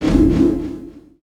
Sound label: Pickup Magic; SFX Kit; Pickups